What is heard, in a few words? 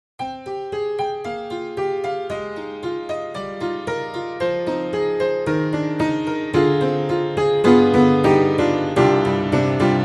Saloon Tack Piano; Upright Pianos; Synthogy; Ivory II